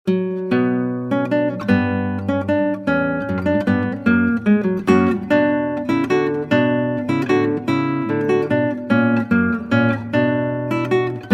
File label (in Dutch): Klassieke Gitaar; Bach 50 Solos